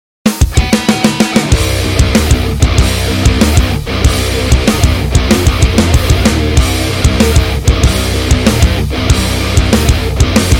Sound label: Energy Metal Crash; Rock Zombie; iOS Linux macOS Wii U Windows Xbox One